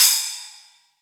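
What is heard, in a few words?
ambient splash, GM drum set; AKAI S-Series CD-ROM Sound Library VOL-8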